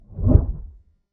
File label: low thwump whoosh